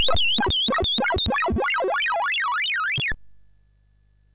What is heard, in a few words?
sid MOS6581R2